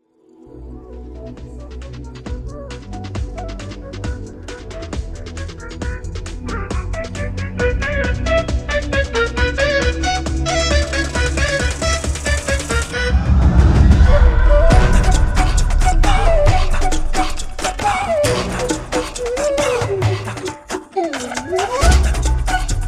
Interval BGM; Sundarakanda

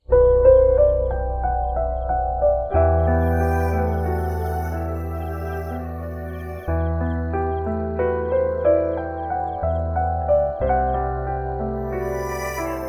Raga of Revenge Piano; theme from DC OST

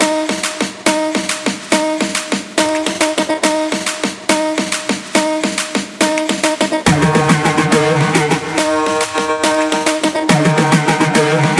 BGM Mix

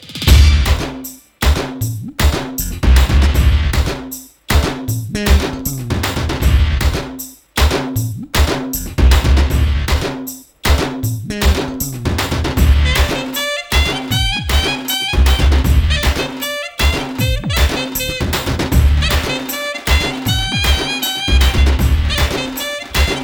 Interval BGM; Shankar Dada M.B.B.S

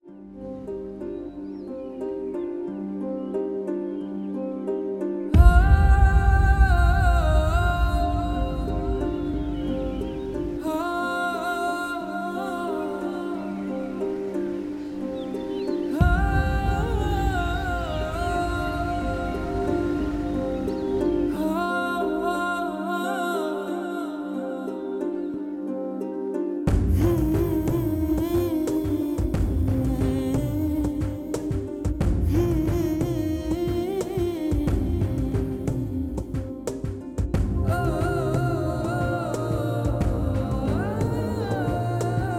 Mother Love BGM; Guntur Kaaram

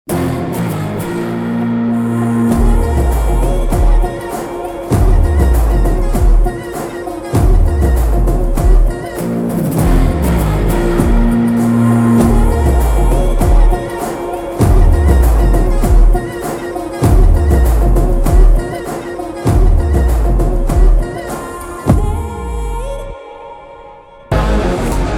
Party Office Mass BGM Mix; Guntur Kaaram

